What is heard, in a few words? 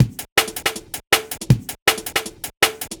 Konk Beat, Drum N Bass; Sonic Foundry (Sony Creative Software); Knutrix Drum & Bass